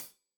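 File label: hat closed, hi-hat cymbals stereo; Deeply Sampled Drums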